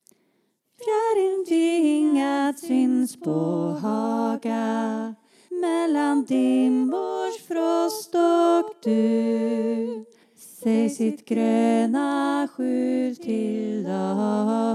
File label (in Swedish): fjäriln vingad Alt